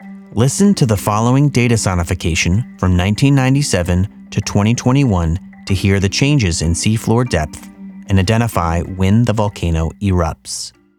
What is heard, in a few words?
Sonification; Axial LT